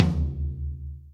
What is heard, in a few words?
tom low